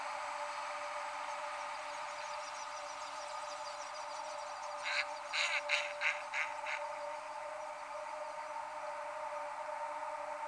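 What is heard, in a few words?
Mallard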